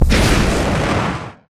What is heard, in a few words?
atlas dash close; Titanfall 2